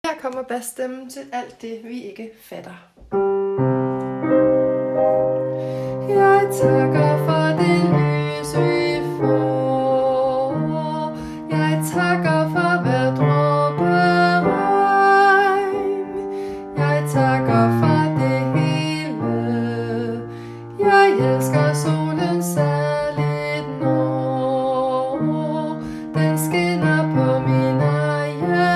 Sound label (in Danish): bas